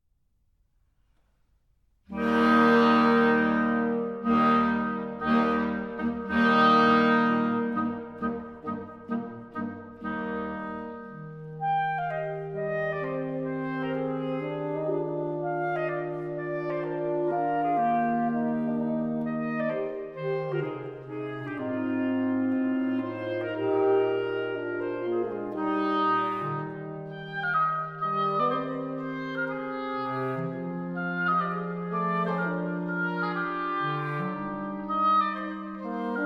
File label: Serenade KV 375 E flat major